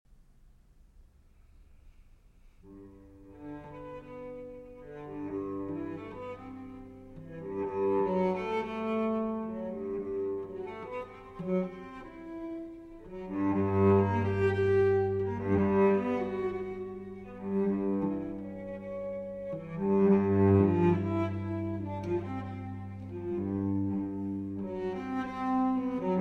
Cello Suite, Barcarolla

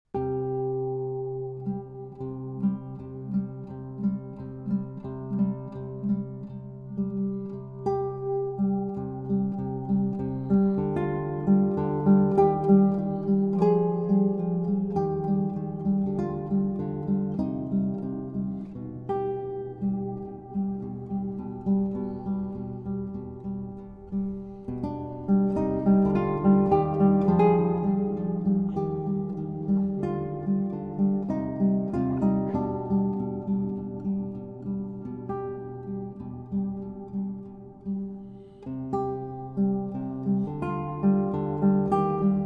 Full Moon (Arr. For Guitar)

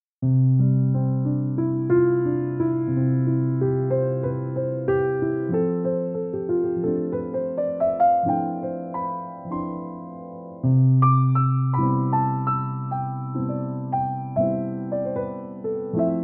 Restaurant Jazz Piano

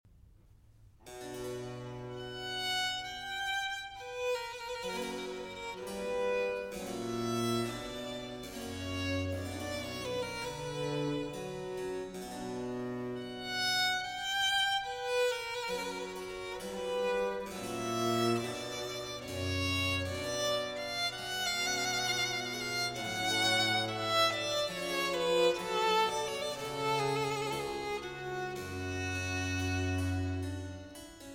Violin Concerto in C Major

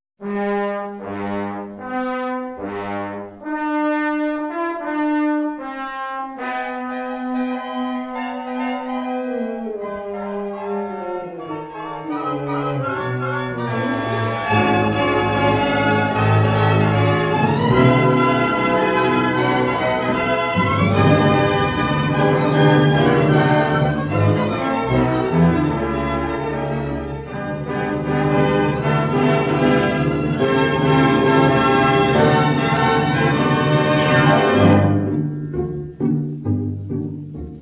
Orchestra

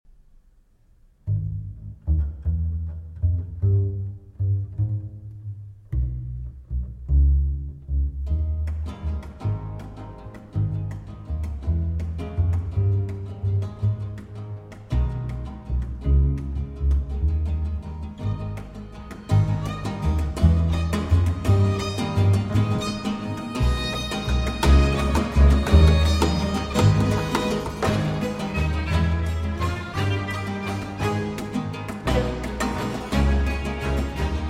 Cello Concerto in A Minor, Allegro; RV 419

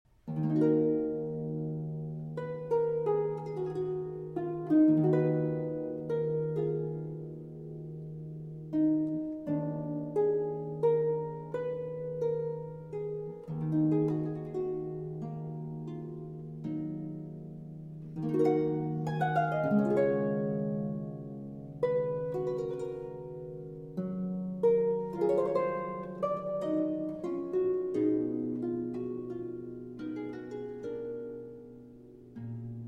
Lute Suite in E Minor IV. Sarabande; BWV 996; J.S. Bach

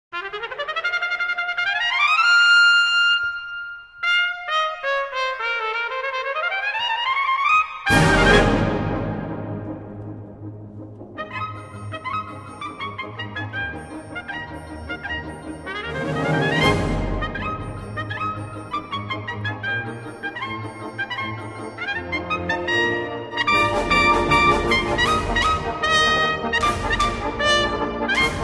Polka; Tritsch-Tratsch; Op. 214

